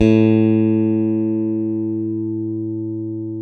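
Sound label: CLN STRAT, Synclavier, GUITARS; Sampler Library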